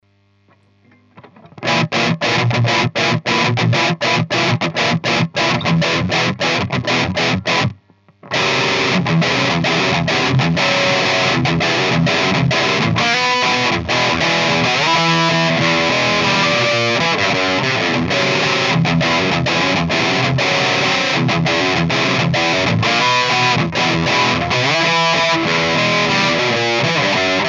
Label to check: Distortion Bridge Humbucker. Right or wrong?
Right